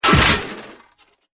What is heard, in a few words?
big crash; spongebob; super-easy-fun-adventure